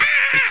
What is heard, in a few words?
ahh